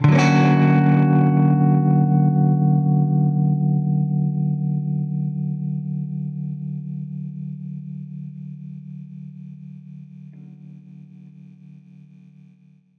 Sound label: Baritone Chord Bmin9[maj7], Guitar Chords; undercover samples